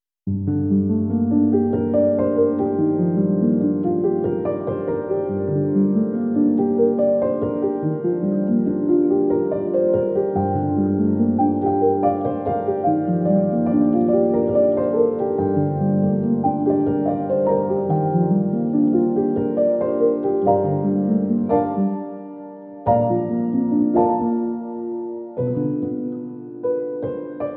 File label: Delicate and pensive with playful edge, Period Drama Emotive Piano; Narrative Mind